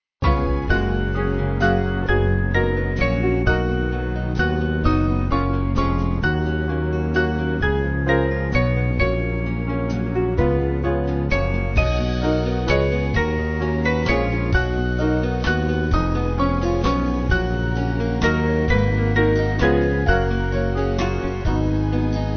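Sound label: Band, Music Backing; Land Of Rest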